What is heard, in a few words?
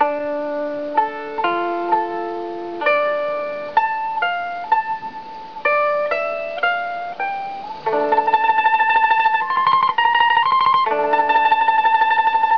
liuqin